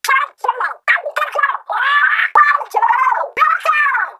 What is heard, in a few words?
Cheers; Grand Fest Tricolor; Ultra Signal Lost